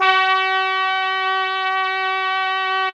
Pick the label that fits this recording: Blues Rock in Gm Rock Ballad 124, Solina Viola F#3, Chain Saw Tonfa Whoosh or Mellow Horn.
Mellow Horn